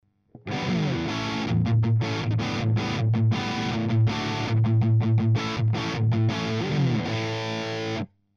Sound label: rhythm; C414